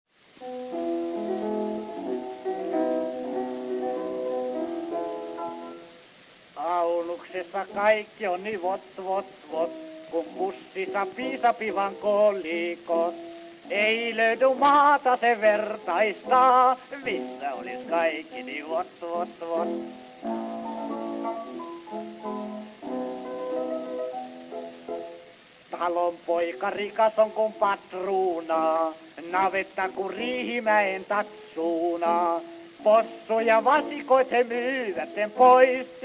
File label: Gramophone